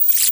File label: Tv Switch